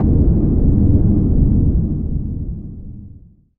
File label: hit, IMPACTS & HITS; Techno Trance Essentials